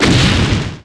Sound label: EXPLOMED; Shadow Master